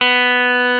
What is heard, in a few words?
BB GUITAR B2; E-MU Formula 4000 Series Vol. 3; Analog Odyssey; VK Instruments